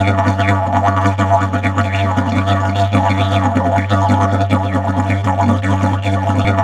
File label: G BURBLE, Didgeridoo; Big Fish Audio - Didgeridoo and Other Primitive Instruments; BURBLDRONE